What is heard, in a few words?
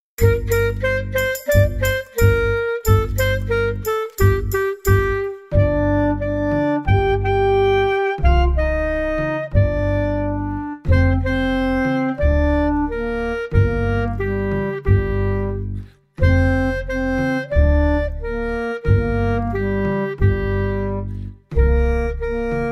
instrumental; im zauberwald